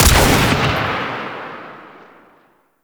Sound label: Sniper Rifle Fire; Warframe; Veilbreaker; Venkra